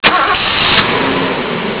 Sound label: car start